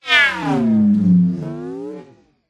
science fiction robot transform metallic mechanical